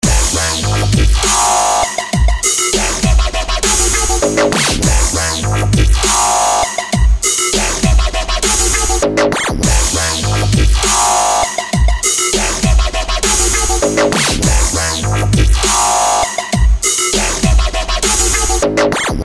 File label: DUBSTEP ringtone